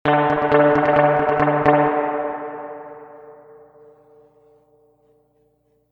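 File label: reverb tank 3 spring short staccato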